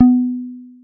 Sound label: ceiling floor contact; phet io wrapper sonification